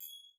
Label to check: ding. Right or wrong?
Right